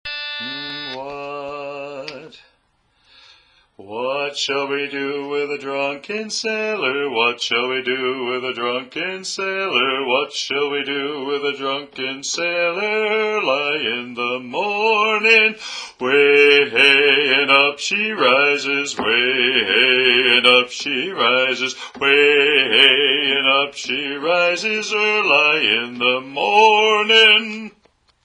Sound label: Drunken Sailor (Bass)